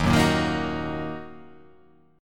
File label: D sharp Minor, chord